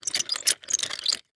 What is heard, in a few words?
Foley Wheel Turns Rusty